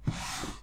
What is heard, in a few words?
drawer open